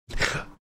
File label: making death rattle sound